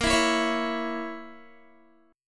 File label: A sharp Diminished Guitar, chord; A#dim; strummed